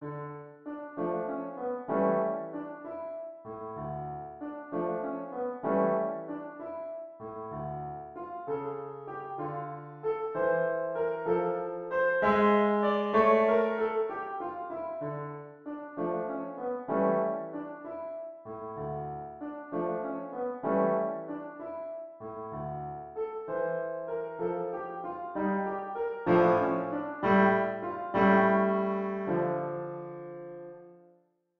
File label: march of the marionette piano